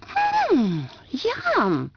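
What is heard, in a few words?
female yum; People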